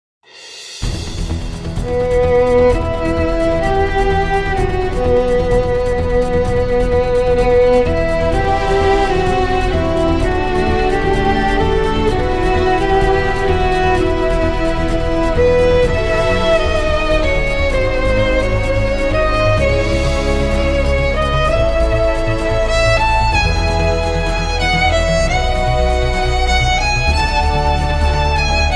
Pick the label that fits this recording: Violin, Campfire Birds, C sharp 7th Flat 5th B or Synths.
Violin